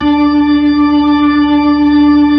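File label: B-3, Hammond B-3 organ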